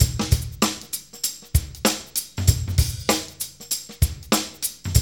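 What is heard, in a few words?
96 bpm, Funky Drums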